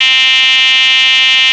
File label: kick, SQ80